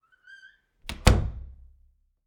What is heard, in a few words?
door close; Golden Crayon Sounds